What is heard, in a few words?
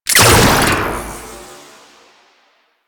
Freeze Ray Shot; Warframe; Weapons; Corpus